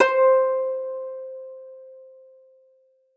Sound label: Koto Clean C; ESW Sphere